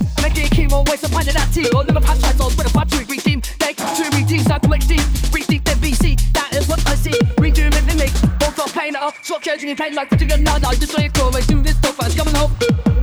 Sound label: dirty phonk